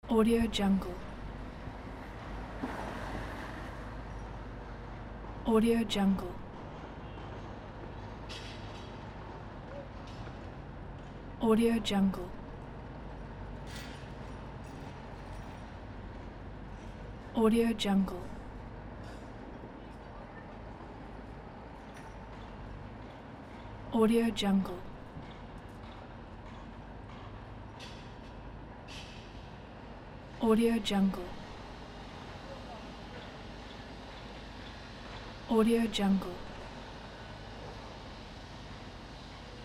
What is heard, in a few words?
construction site